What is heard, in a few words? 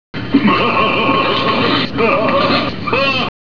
laugh